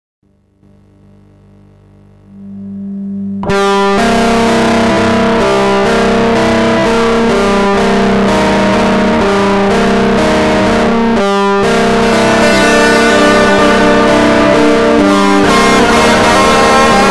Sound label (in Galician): GUITARRA NOVA